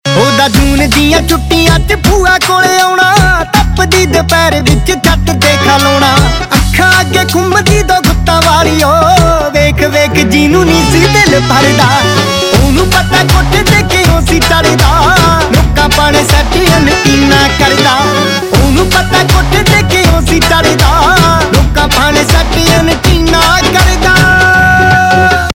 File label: Ringtone, Punjabi